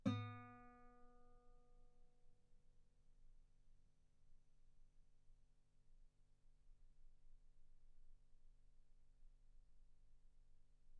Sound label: Guitar ff sul B B3